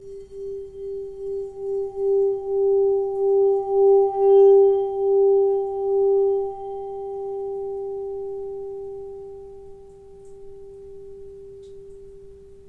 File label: Tibetan Singing Bowl